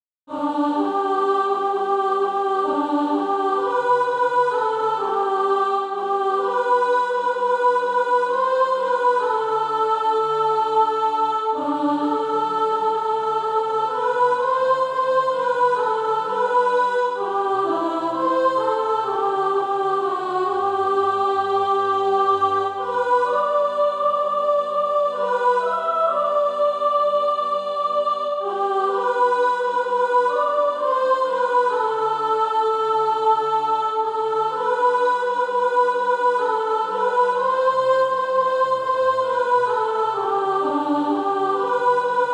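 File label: soprano, SATB Practise Music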